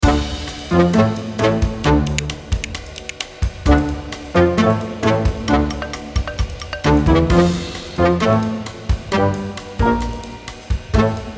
comedy quirky sneaky background music

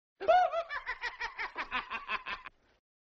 laugh; joker; cesar romero

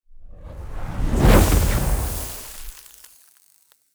fast ignition fire

